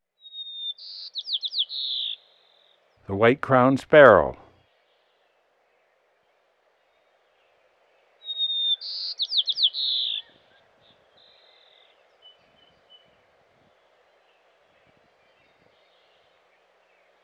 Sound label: White-Crowned Sparrow; Western North America; Dan Gibson; Wildlife Identification By Sound